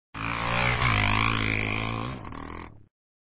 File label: Sound Motorcycle solo; flipper